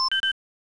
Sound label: plasma new cell